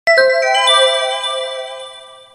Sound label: Sony Aibo ERS 111 Aiboware Software Boot Sound